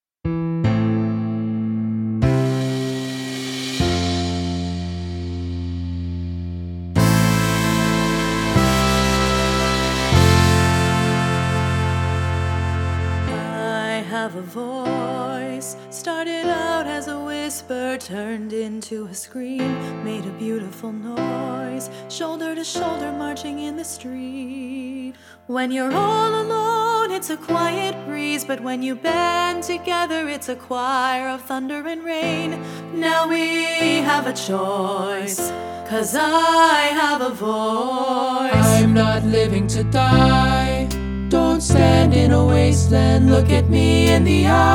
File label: Rehearsal Track female solo